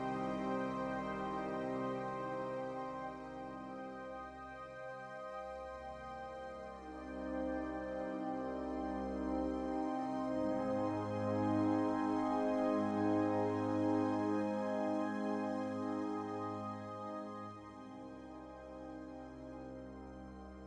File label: waltz Loop